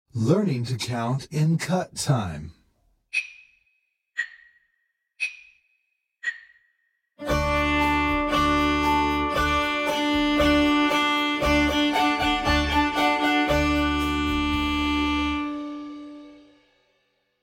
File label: Learning to Count Cut